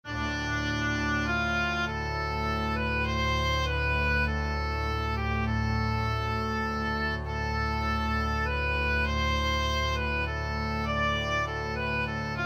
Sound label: Texture biphonic; texture exercise thick and thin